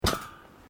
Footsteps Boots Grill Metal Step

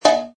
Metal Can drop; Metallic; Metal sound 10 Can Drop 4

